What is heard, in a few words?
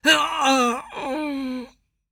Male Death; SandBox Musics